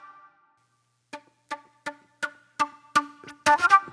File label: Jammy Flute